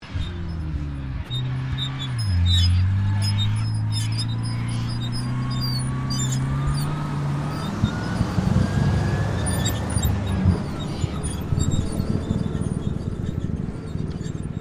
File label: APC FV603 Saracen Manouver, British Saracen Light armoured vehicle; Trucks; Vehicles; SoundEffects-Foundation1